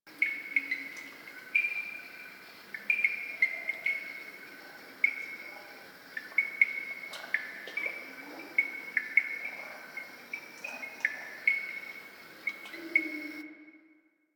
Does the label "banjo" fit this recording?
No